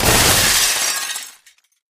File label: Glass Wood